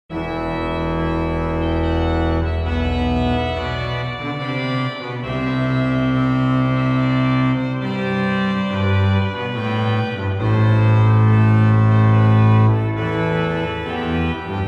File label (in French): Kyrie Grand Choeur, OrganMusic; Beauvarlet Charpentier Journal; Souvigny